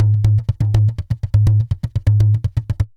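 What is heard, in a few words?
tantango groove; Best Service; World Colours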